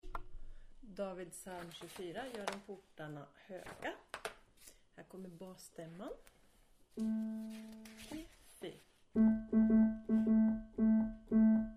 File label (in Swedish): bas; Davids Ps Gören portarna